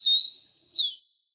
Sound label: dolphin idle water; mob; Minecraft